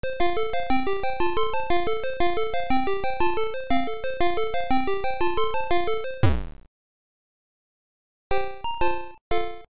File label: jingle intro